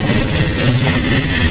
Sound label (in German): duese